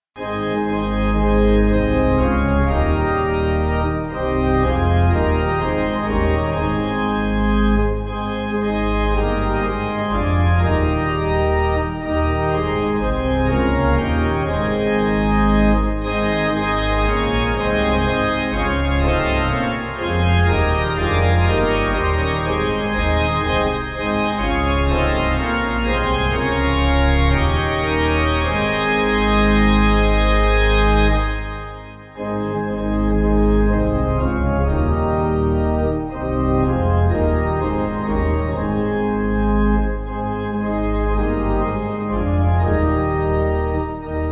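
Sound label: Trumpet Pipe, Pipe Organ; Arise My Soul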